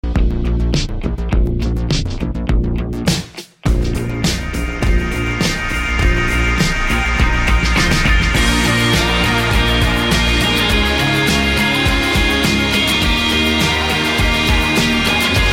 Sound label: Nokia Rock